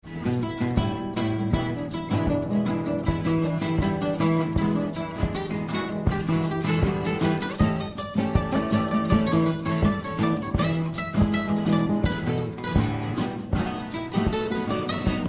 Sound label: Trio blues